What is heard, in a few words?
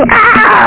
burn; ARCADE